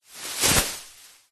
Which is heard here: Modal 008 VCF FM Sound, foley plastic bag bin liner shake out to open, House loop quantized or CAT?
foley plastic bag bin liner shake out to open